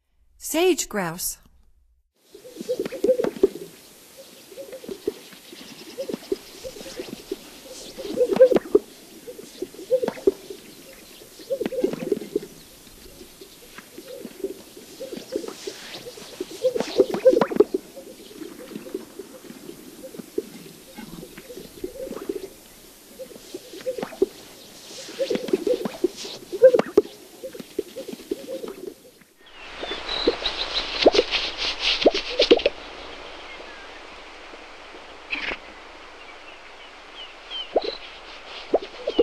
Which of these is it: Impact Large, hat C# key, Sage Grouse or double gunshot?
Sage Grouse